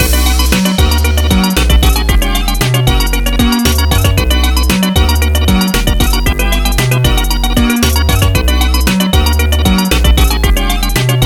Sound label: Instrumental; Friday Night Funkin' Vs. Vloo Guy; Goombox